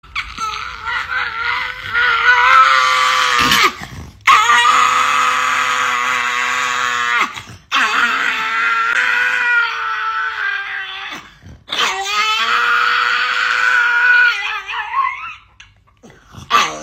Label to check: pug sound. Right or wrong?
Right